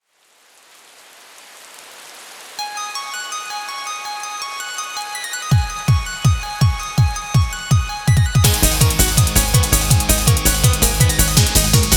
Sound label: Instrumental